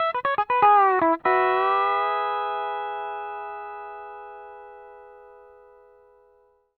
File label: L, Whole Lotta Country, ENDING120; PD ENDING120